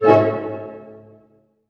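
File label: harp hit D# major; Sound On Sound; Peter Siedleczek's Orchestra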